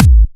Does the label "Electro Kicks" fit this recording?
Yes